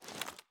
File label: ads up, reloads; gmod